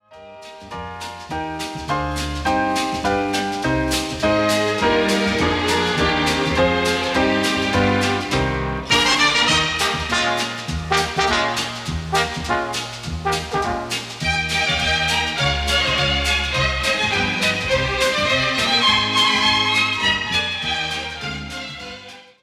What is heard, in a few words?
Orchestra